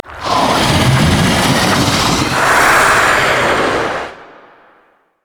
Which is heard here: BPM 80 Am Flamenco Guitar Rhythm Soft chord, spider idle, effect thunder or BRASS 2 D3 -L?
spider idle